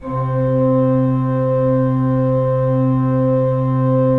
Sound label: 3D Pipe Mix Celeste, 3D Organs